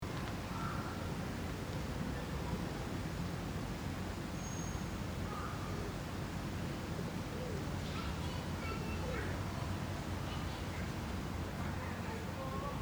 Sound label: city noise